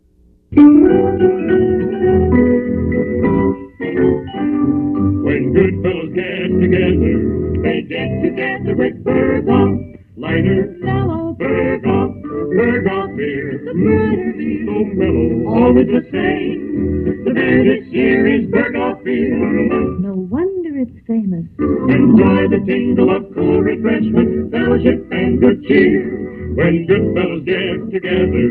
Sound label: jingle, Beer Radio